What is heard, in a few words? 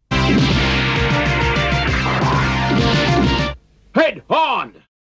Commercial Bumper Sting